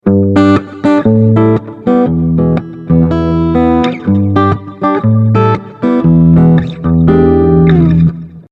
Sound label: Behringer ACX450 Mod Delay